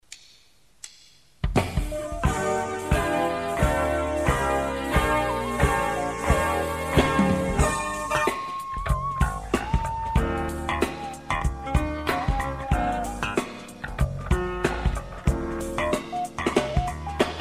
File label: Syncopate Live Backing track; Black Gospel, fusion